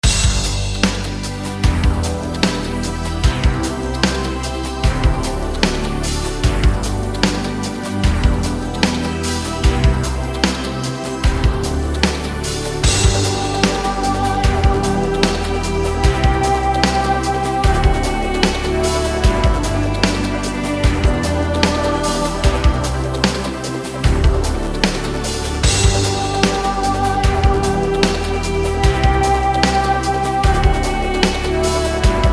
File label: MUSIC TECHNO